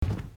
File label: ghost step; phasmo